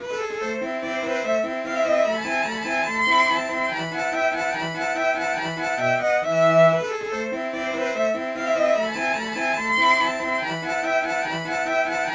cello

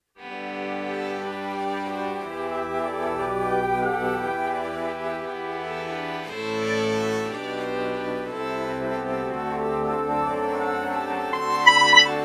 alto flute violin morph

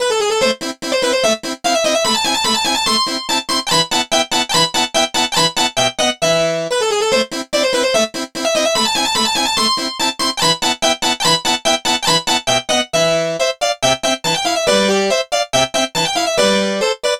harpsichord; SpectMorph Evaluation #4

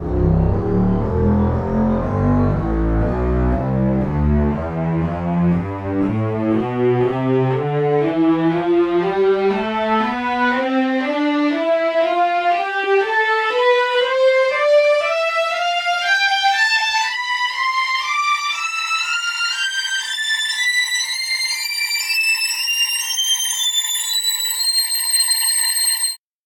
tremolo tonleiter

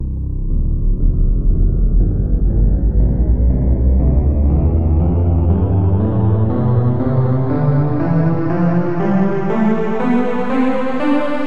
brightness tonleiter

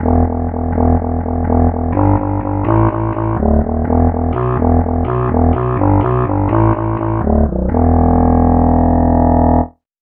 tenor sax bass

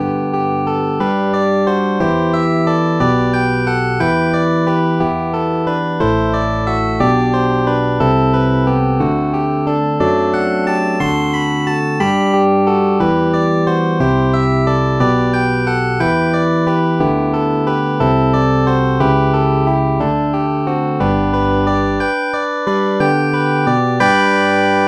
fingered bass

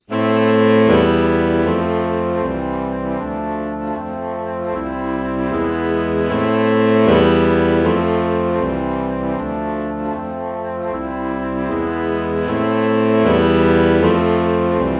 alto flute alto saxophone chord morph

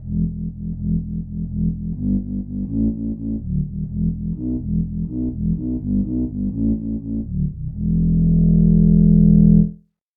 oboe bass

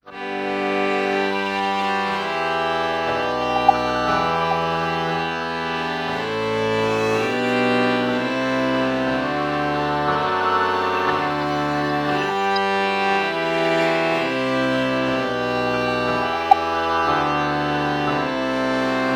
oboe violin lpc true bach morph

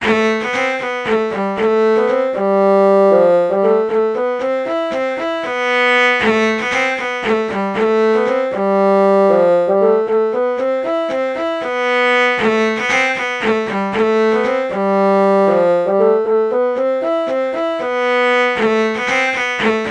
cello bassoon false melody morph low

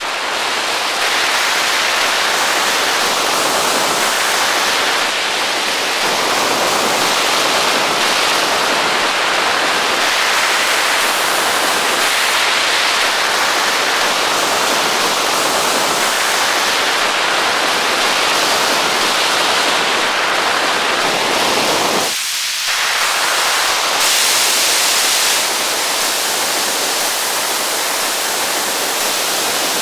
applause

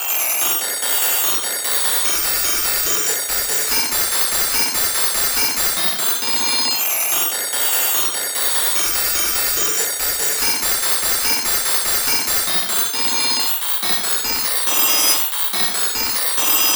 telephone

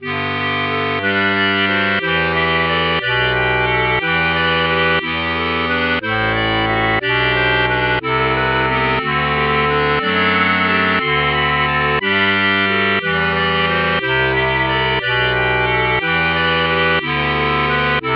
clarinet

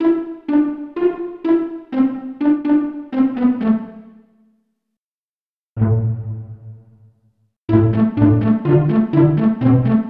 pizzicato section

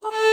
ah violin melody morph

